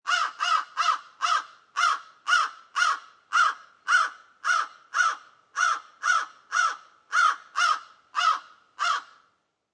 Crow Caw Multiple Long, A single crow caws repeatedly.; Bird Sound Effects; Animals; Lynne Music